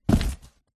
foley cardboard box set down on gritty hard surface; zapsplat